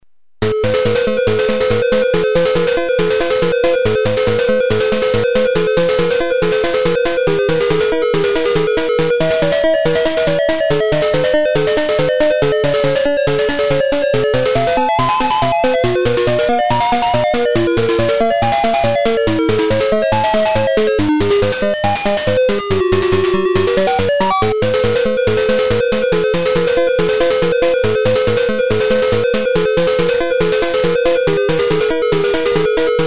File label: Boss Music; the guardian legend